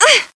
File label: pain